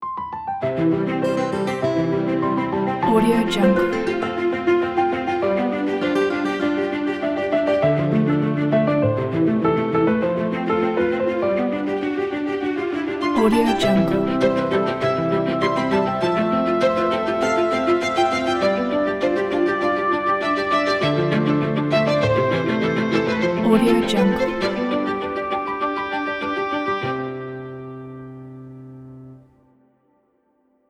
tv intro logo

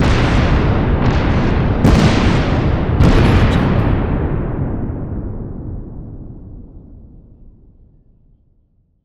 explosions

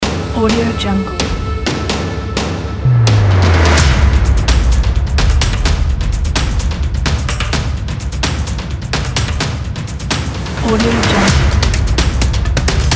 strong percussion

